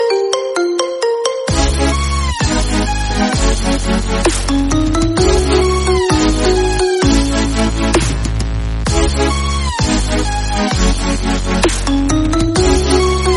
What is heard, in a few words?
marimba remix ringtone; english ringtones